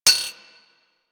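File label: machine gun mech; Warframe; Archwing; Weapons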